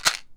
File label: Vitamin B - Shake, pill bottles